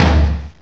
ambient tom; tumbledemerald

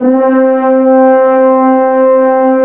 sc88pro french horn 72; tumbledemerald

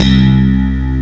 unison slap; sc88pro; tumbledemerald

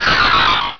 snorunt, cries; tumbledemerald

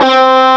sd90 classical overdrive guitar; tumbledemerald

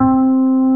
sc88pro harp; tumbledemerald

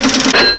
register noise; tumbledemerald

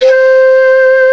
classical shakuhachi; tumbledemerald

